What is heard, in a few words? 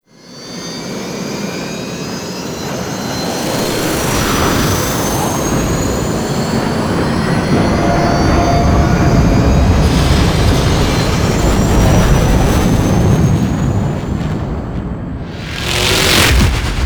Grineer Ship Liset Extraction Space; Vehicles; Warframe; Liset Flight